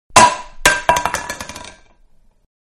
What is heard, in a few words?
Large Can Fall On Wood; Metal; Materials; Manhattan Production Music